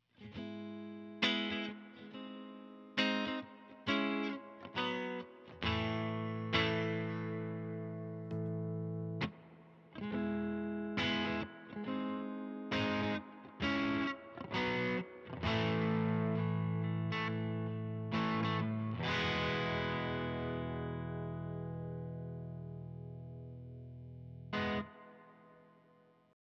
Fender Stratocaster Princeton Reverb volume set to 3